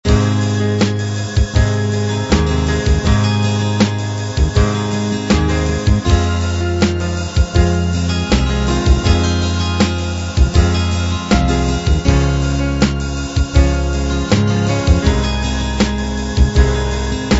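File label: R&B Ballad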